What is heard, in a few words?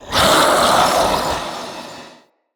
Male Vocal Attack, Enemy; Mockery; Mockeries